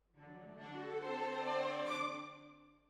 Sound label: Hall, Jazz for piano